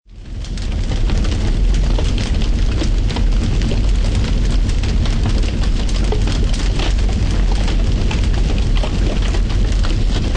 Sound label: Forest Fire, Heavy wildfire forest fire burning, trees and growth burning with large flames.; Great stereo definition.